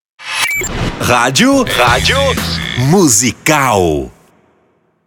ponte musical

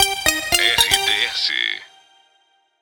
ID NEWS

